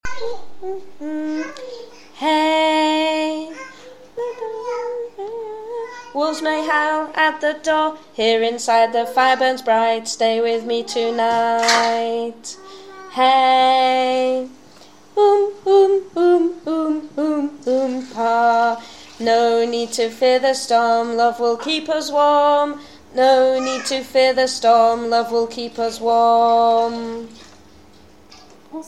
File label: tenor words